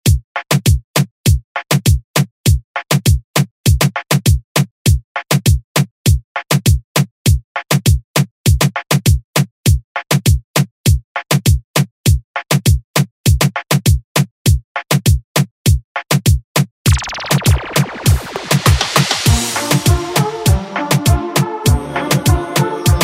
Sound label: Latin Urban Redrum; Deejay Hit; Columbia